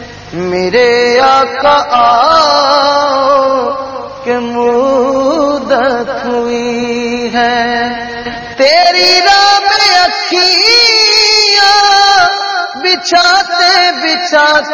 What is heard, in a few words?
Islamic Ringtone